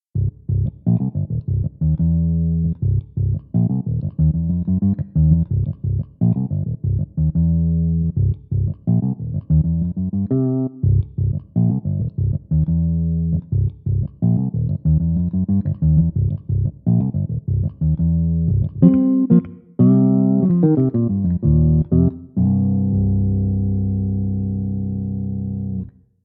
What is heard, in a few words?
5 String Bass; VIVO S10